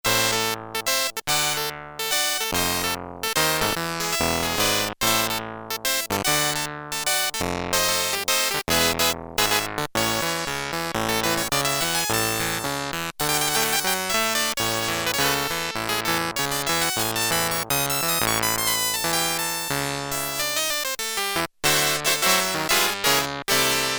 Harpsichord, Prophet-5; Sequential Circuits Prophet-5 (Ver.3); Factory Patch; File 3 Programs

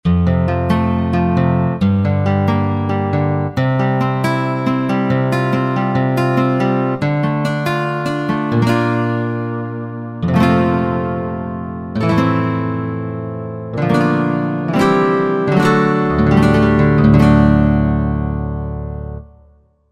Acoustic Guitar, Korg Wavestation A-D

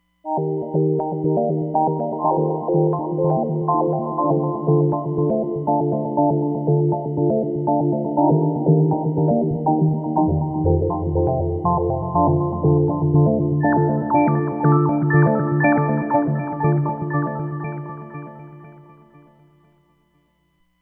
Magic Rays

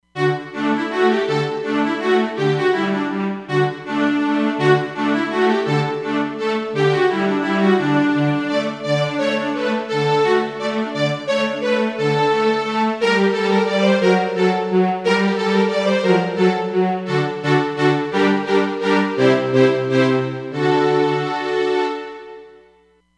Crossed Bows, Roland Fantom XR

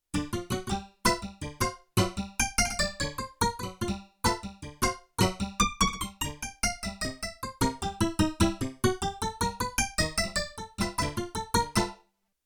Chorus Short, Harpsichord, Yamaha TX16W

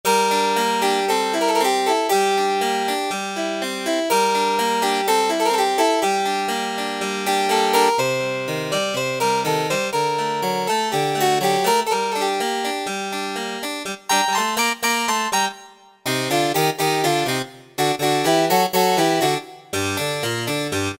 Harpsichord, Roland SC-50 Sound Canvas; Factory sounds

